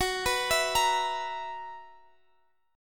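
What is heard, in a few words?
B Major 7th Suspended 4th, G flat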